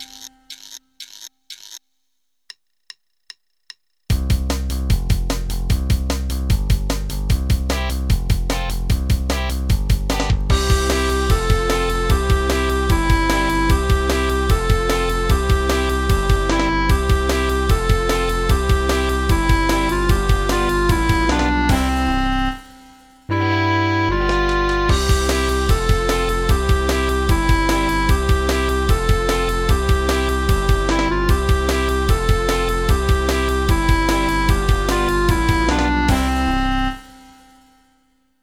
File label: My Robot fast accomp